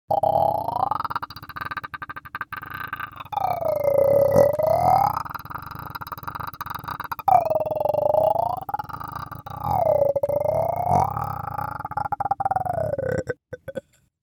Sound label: Clicking Monster